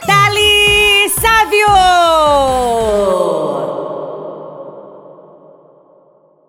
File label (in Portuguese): grito; demo locutores